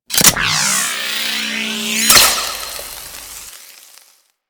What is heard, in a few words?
Remove Bolt Zap; Tenno; Warframe; Tutorial